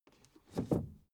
suv 1st gear release slow soft; verkehr transport; autos

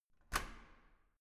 door key lock

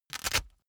boxing gloves open velcro short; sport freizeit; boxen

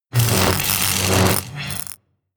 electricity; comedy cartoon

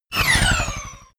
sci-fi laser shot long; krieg-waffen; soundpool

